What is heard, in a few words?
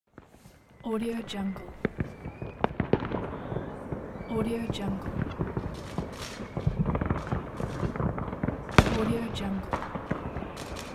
fireworks